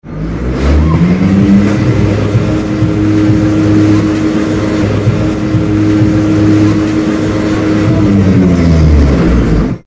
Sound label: motor; XLR8; Kermis XLR8 Jarno Otten